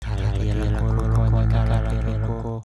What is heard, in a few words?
Intense